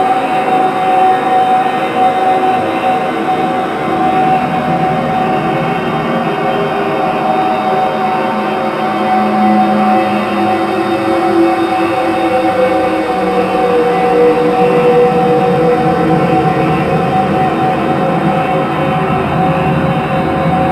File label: Space Trench Run Amb; Space Battles; Exterior